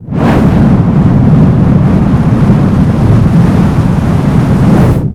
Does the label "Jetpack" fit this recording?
Yes